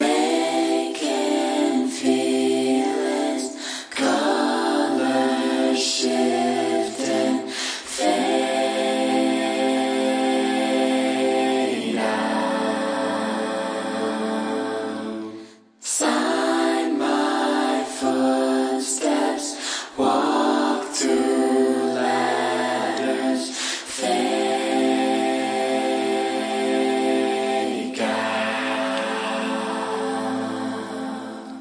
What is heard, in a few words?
vocals vocoded; vocal acapellas; various acapellas